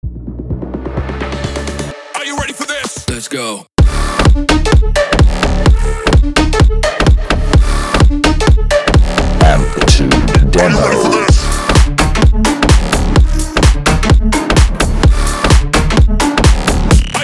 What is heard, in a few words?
Are You Ready, BASS HOUSE, D Minor 128 BPM; lets go bass house d minor 128 bpm